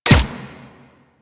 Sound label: Energy Rifle Fire; Warframe; Weapons; Energy Rifle Vandal